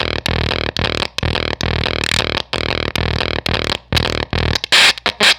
Fuzztest drum fuzzfactory

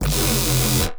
riddim sweeper; ultimate vital bundle; rocket powered sound

